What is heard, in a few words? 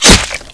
head hit; cstrike